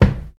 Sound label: mid heavy bass drum single shot b key